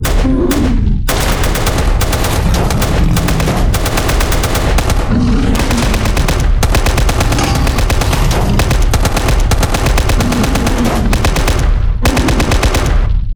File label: fightscene; STALKER